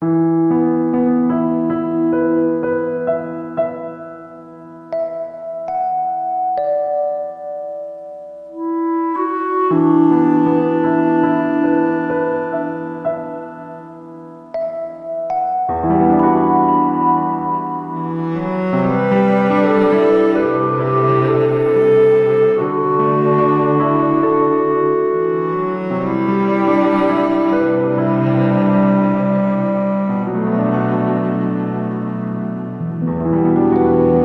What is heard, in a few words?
melancholy muse